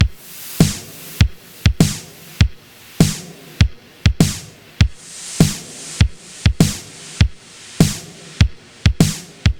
100 Beat; Zero-G - Future Wave

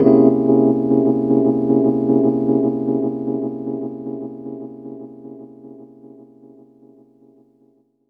Rhodes Chord; Zero-G Jungle Warfare 1, 2 & 3; 90 s Sample CDs

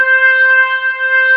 B3 C 4, ORGAN; AKAI S-Series CD-ROM Sound Library VOL-8

